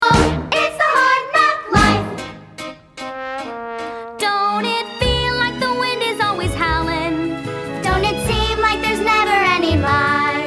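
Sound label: with vocals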